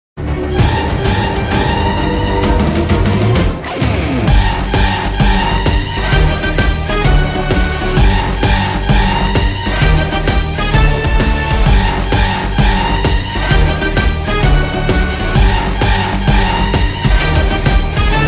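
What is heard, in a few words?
background music Ringtone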